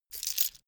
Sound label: key ring large put down; objekte; schluessel